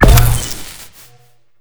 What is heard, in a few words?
fire, weapons; tf2